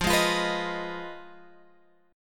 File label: F Minor 7th Flat 5th, Guitar, Open G